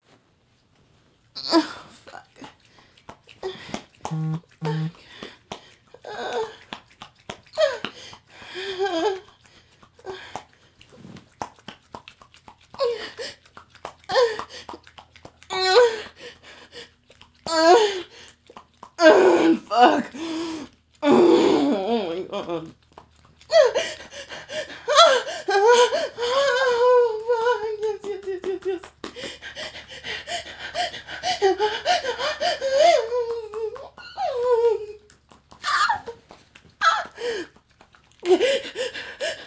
wet sounds multiple orgasms; [F4M] Monday Microburst; [HM4A]

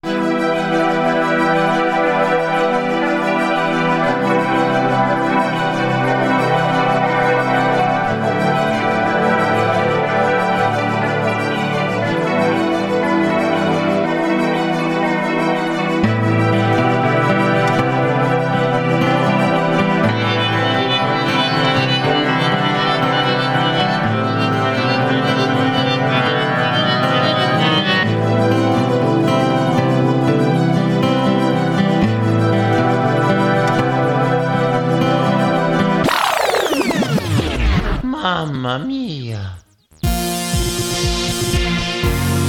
liquid chemicals dubstep mix

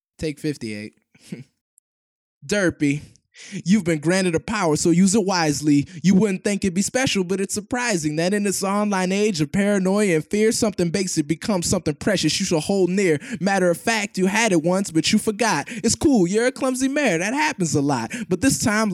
vocals only 97.5BPM; Acapellas

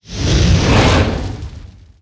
Monster; Zenless Zone Zero

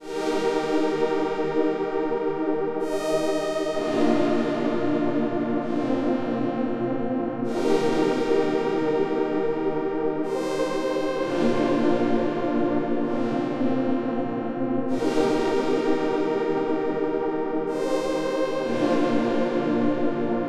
pads; 129BPM; Pony Fan Music Remix Stems

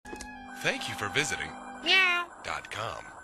Meow

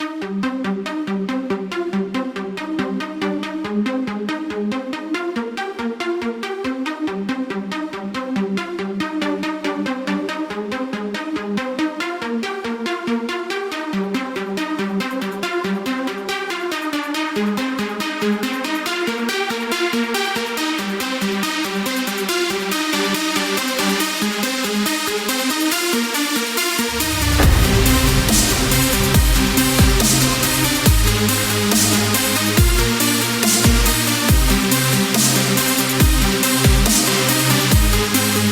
Supersaw thingy, Melodic Dubstep

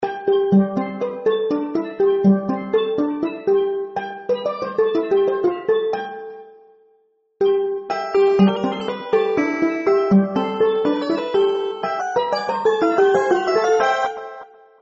alternate tuning experiment

